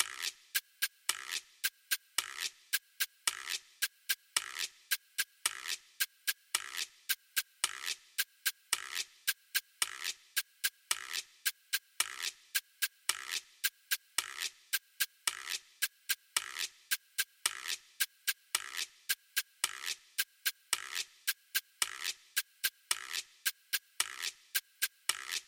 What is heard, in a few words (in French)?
bolero cubain guiro